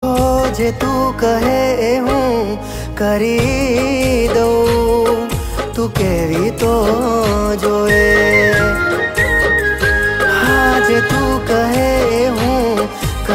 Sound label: Song Gujarati